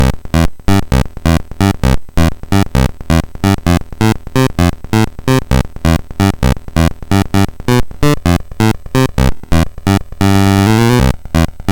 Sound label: Bass, MOS6581R3; hvsc